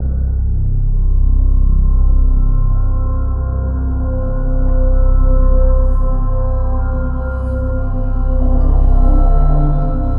Big Beam Drone; Nexus Tower; lego universe macos windows gamerip 2010

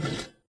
Spike; doodle jump 2; android

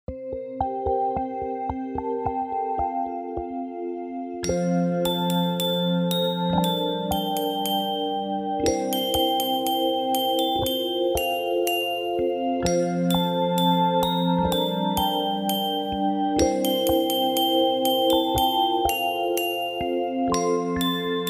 Hush Little Baby Lullabies; The Sims 4

